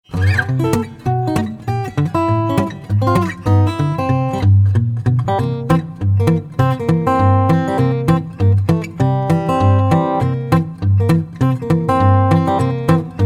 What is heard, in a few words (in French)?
Blues De Vache